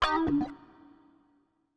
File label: ELEC GUIT HOUSE 125 A, Guitar; eJay